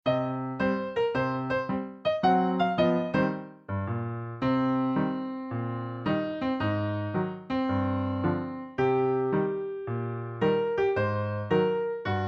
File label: wade in the water instr